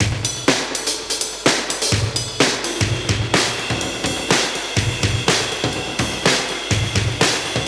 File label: 125 Classic Breaks, 125